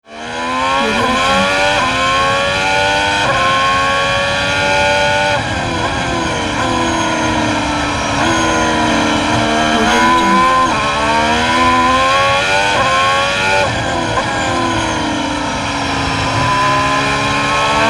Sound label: engine work and acceleration by f1 racing car